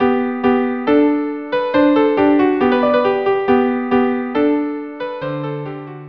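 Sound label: viola duo allegro; KV 487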